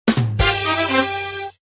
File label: Banana Slip, Slipping on the banana sound effect; Die of Death